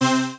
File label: low string; Super Metroid